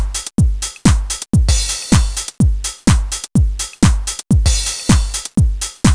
drums, synthesis

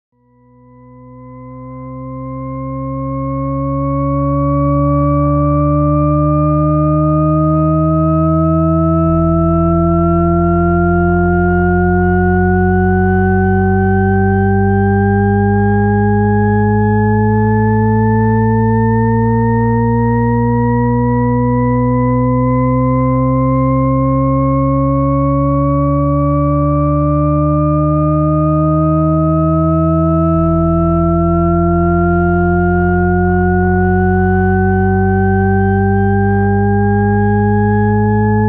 Risset's Ever-Rising Glissandi

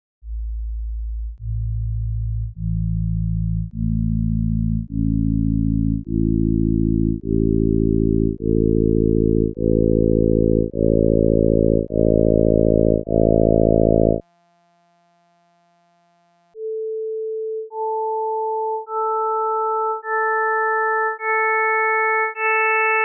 Adding Harmonic Partials

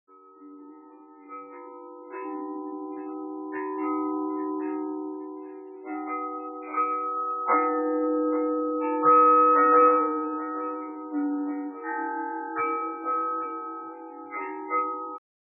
shorter windchimes synth

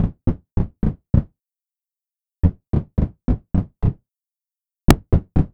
door pound, synthesis

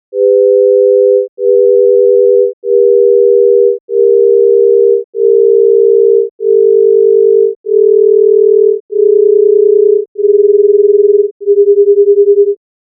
Beats Equal Amp. Sines

